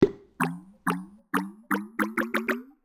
Potion

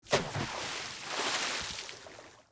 splash

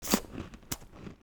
Feed

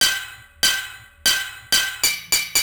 mining

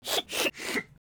Sob

